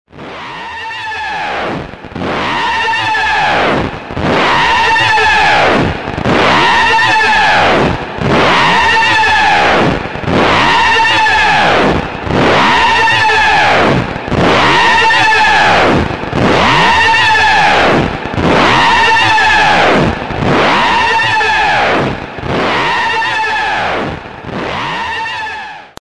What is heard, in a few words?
mix scream